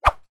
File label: foley stick twig swish air fast